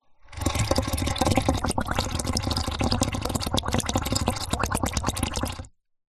nature water air blow through tube surface water bubbles